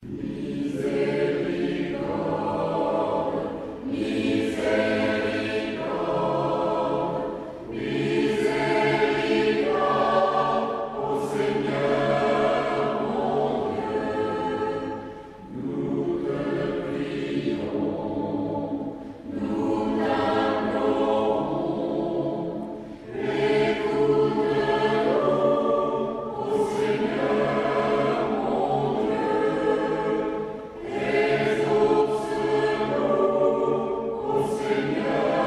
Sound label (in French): Tutti; misericorde